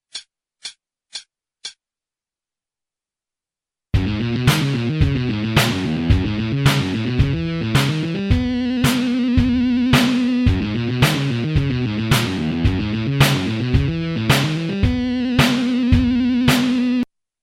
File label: bebop backing, 110